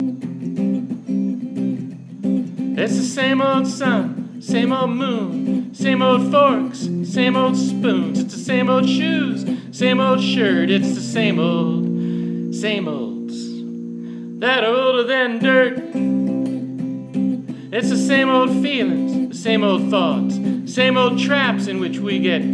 jazzier

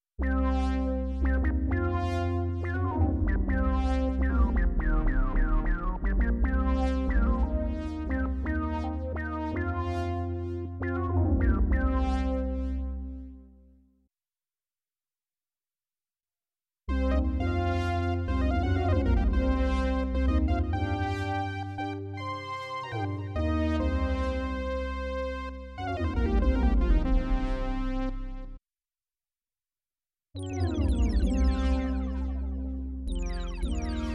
Korg iMS20 iPad

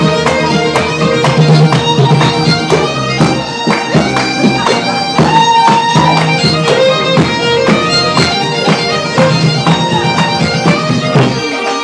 pipes

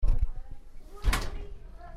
door closing, door bang; Fermynwoods

